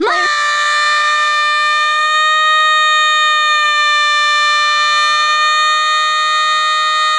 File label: mom scream